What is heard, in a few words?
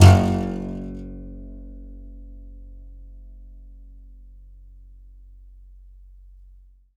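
contrabass balalaika, Plucked Strings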